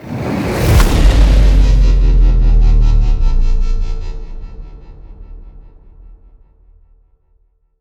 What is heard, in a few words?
Stinger Modulate